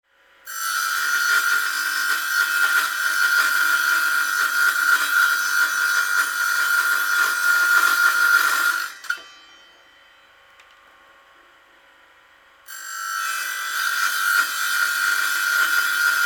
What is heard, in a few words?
chain saw